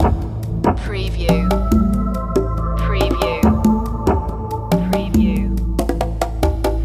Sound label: deep jungle loop